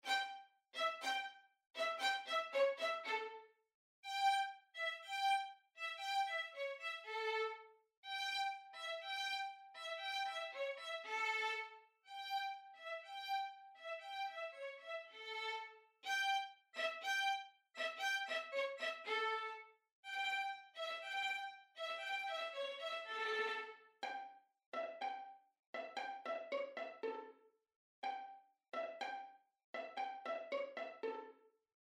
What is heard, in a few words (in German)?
streicher variationen